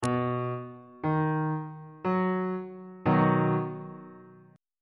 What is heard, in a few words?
a double sharp major chord